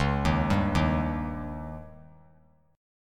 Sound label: Db Suspended 2nd Flat 5th; arpeggiated; JGuitar